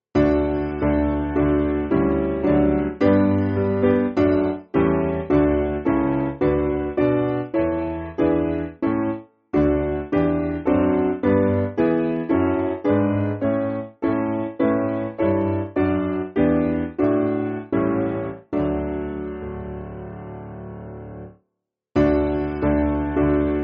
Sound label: Piano